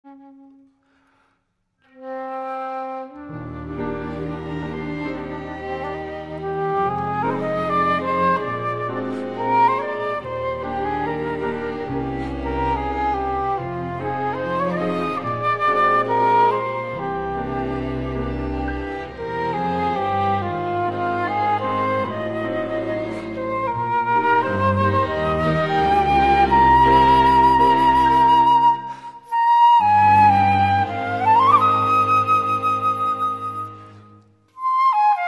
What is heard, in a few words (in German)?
Kakadu Floetensolo